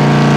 jalopy mid

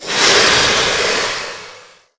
spider monster ouch strong

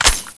clip in; tfa cso; m249p

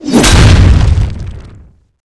swing scythe attack strong hit

